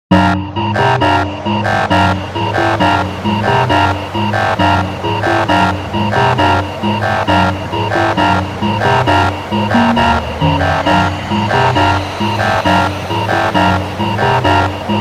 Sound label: Warning